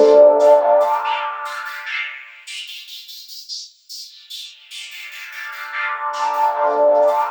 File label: FIZZY PULS, Synth FX Misc